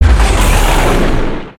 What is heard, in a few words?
Dark Thunder; Sorcerer